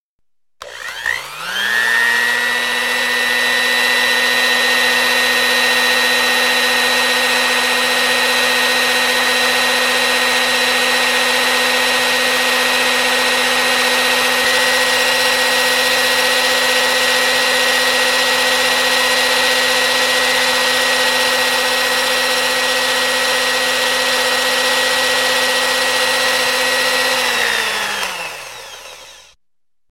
mikser elektricheskij vklyuchaetsya viklyuchaetsya

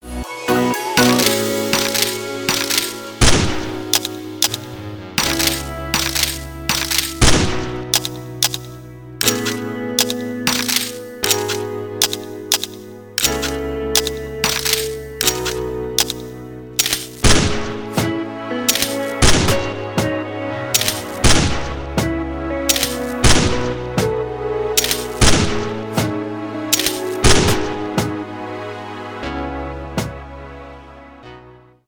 karta tochki vibrato vistrel karti karti karti ritm

